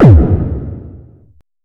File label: Bassdrums Hard Kicks; Vengeance Samples